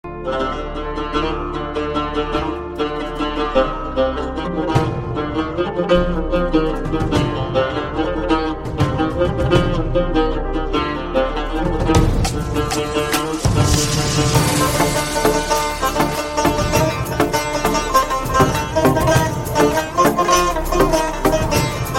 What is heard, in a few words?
Happy Background Music